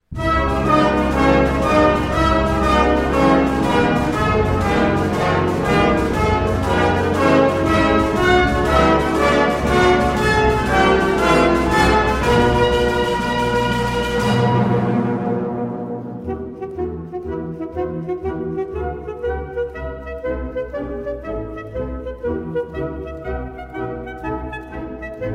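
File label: Rhapsody alto sax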